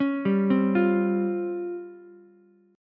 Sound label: C sharp Major 7th Flat 5th, C sharp, Ukulele; arpeggiated; chord; 6,7,8,8